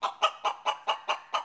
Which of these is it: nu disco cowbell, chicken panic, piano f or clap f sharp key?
chicken panic